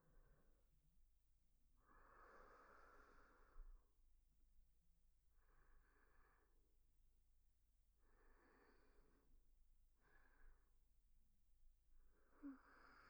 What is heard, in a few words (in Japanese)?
特典トラック 寝息(右側); ASMR